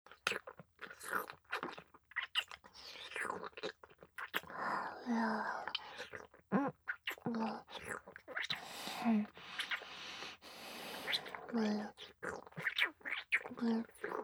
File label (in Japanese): ディープキス; loop音声